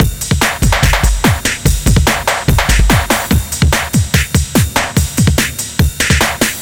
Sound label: drums, Hardcore Breakbeat, 145, Ebmin; Big Fish Audio; Hardcore Breakbeat Action; Riddim